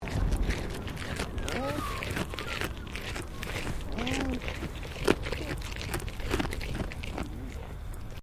Animal Alpaca Female Eating

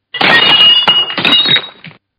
Glass Break; Materials; Partners In Rhyme

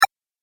Game Power Switch, video game

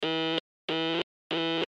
Morse Code O Letter; Morse Code Sound Effects; Technology

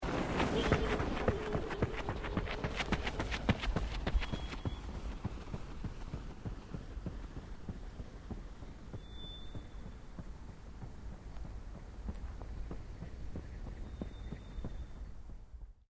PERSON CRAZY WITH SPARKLERS RUNNING

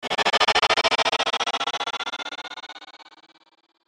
Chopper Metallic Light Static Ascend; Blastwave; Chopper Sound Effects

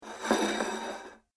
Medium Side Plate Slides Accross Table; Dishware Sound Effects; Household Sound Effect

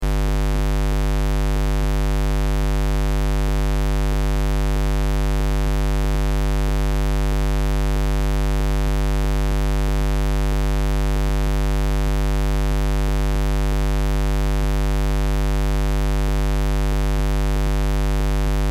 Test Tone Square; Wave 50Hz 60 Secs -18Db Loop; Blastwave

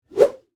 Whip Whoosh Coax, Cable; Foley; Objects; BlastWave

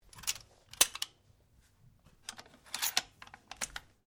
RIFLE REMMINGTON700 7.62MM BOLT ACTION CLOSE; A selection of domesttic firearms; Rifles; Guns

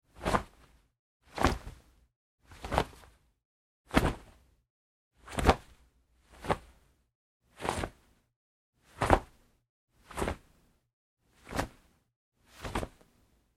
Foley Fight Moves Pillow Case Singles Med; Wrestling And Struggling; Big Room Sound

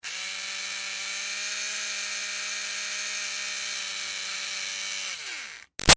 Electric Motor Small Run Med; Motors (Sound Effects:Machines); Big Room Sound

